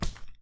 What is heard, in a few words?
Bare Footstep; Lethal Company